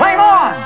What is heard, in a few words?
FLAME ON